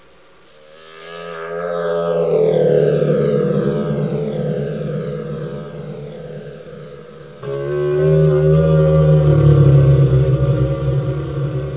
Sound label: nightmare, ambience; gearbox